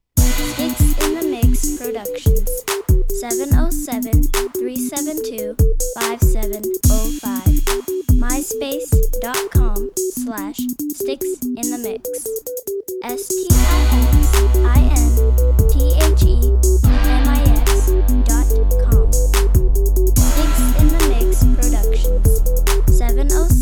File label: scary crunk